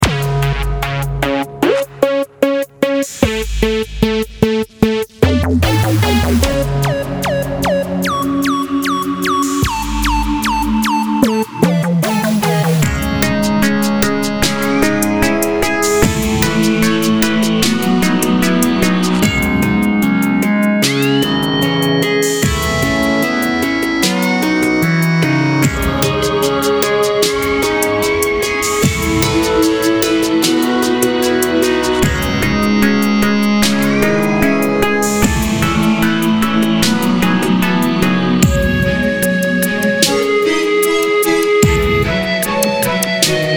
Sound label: strange sanctuary, A melancholic tune for a level or cut scene.